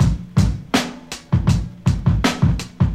Drum Loop; ForestHill

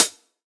hihat

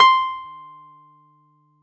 Piano, C6

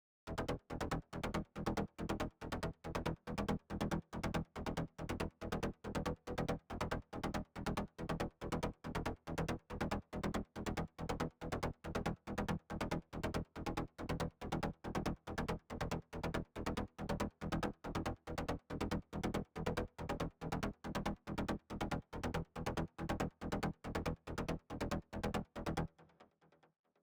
Mid Bass Wet

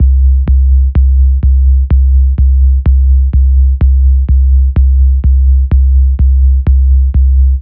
bass melodie C 126

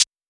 90 perc

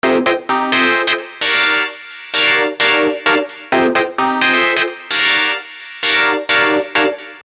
Rave Stab

